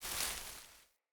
Surface Body Roll Vegetation; Characters; Foley; Surface Body Impacts

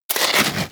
Armadillo Roll Snow

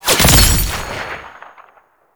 Kill Notifier; Warframe; NineteenNinetyNine; Quincy

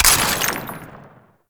Ammo Pickup Blast; Ammo Ability; Warframe; Warframes; Frumentarius

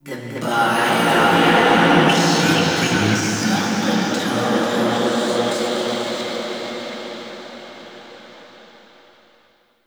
Golden Maw Queen Cave Echoes One; Warframe; The War Within

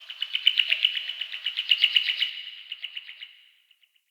Forest Open Glade Bird Med Two, Day

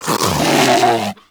predator vocal alerted; Warframe; Hunting Critters; Characters

